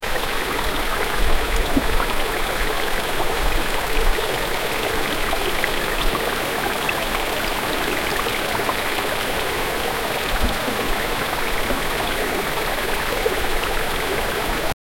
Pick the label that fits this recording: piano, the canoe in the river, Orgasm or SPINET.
the canoe in the river